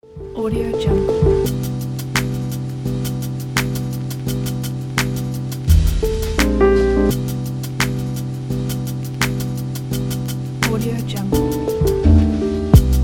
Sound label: lo fi chill background